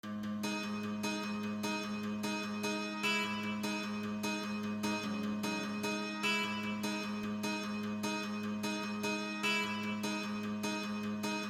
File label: Acoustic; PowerRangers